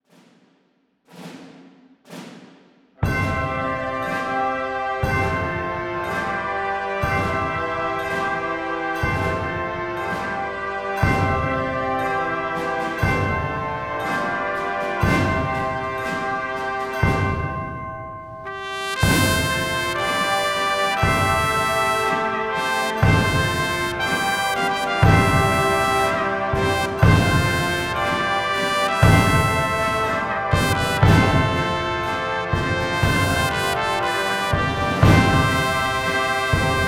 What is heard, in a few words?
Christmas Style